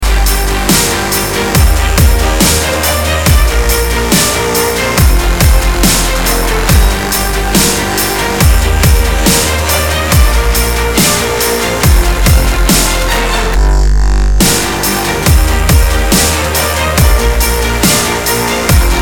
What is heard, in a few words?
dubstep remix